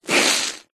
household sponge large wet soapy foamy squeeze squelch; zapsplat